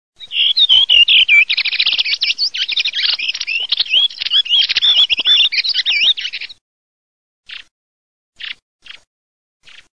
SASSELLO SIENA

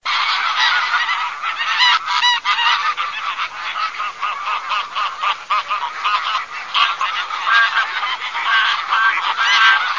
OCA DARIO, birdsound

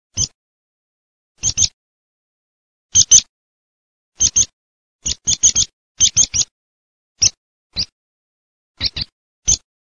pispola

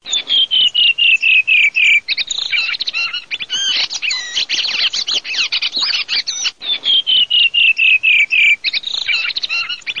sassello

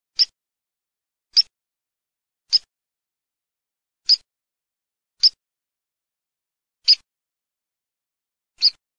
FOLAGA

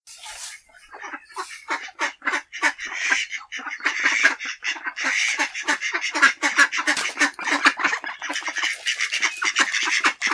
germano

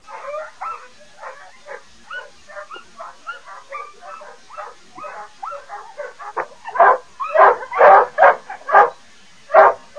cane

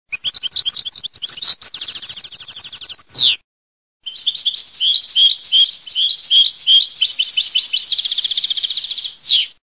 CARDELLINO, birdsound

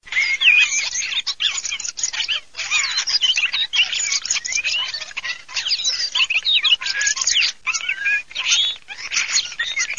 STORNI

